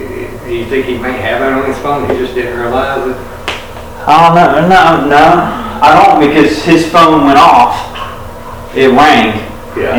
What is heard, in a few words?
Unexplained Female (Sigh-moan)